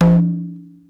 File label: kompang doh